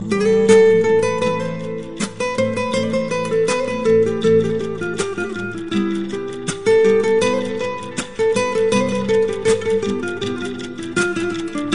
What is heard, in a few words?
Persian Music